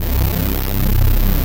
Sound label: FAT ATTACK, DANCE; Pro-Rec